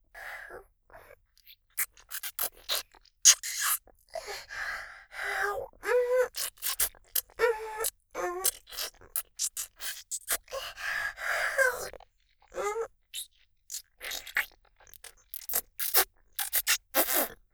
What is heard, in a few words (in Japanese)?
フェラ; ありすちゃん（リスみみの地下アイドル＋コンビニバイトAさん）